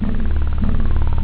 Shield; Más de 2,500 Juegos

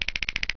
slider

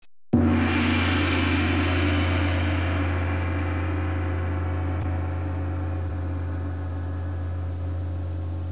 gong

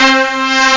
horns; Epic Collection 3, The; Epic Marketing; muzak

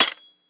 mech; Alexander

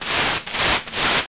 BROOM; NOAH DEMO

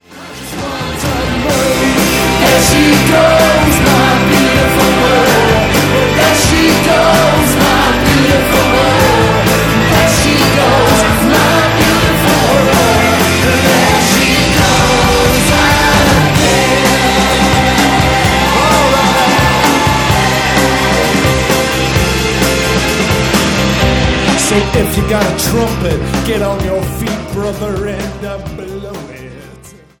cave02; Realtime

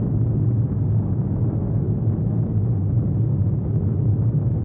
power station; Mega Game; Team Alligator

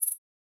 cicada

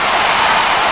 ski; NewSurfs

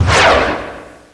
rock shot, weapons turret; chaos

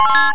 chime; Amiga

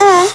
Hmmm; HyperCard; FontAnim1

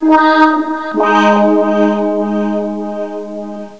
Wah wah wo oh

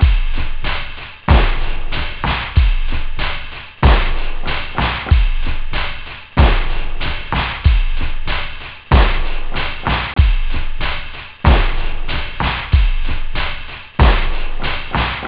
break, house; OctaMED SoundStudio V1

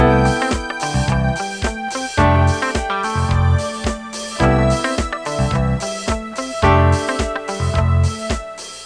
disco; Sportware Golf

